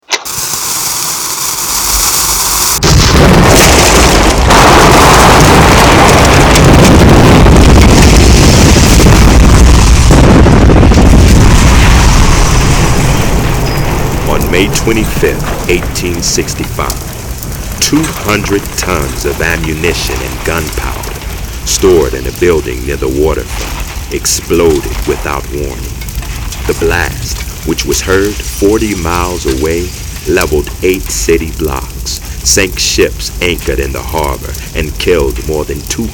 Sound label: MAG EXPLOSION; MOBILE